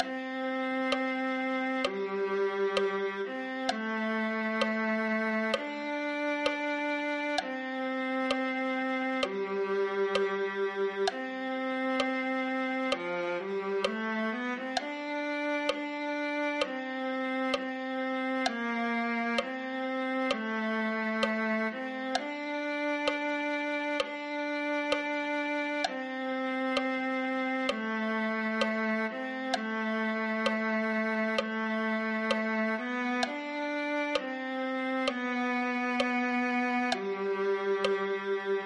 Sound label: viola